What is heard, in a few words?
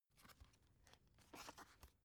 dvd case handle soft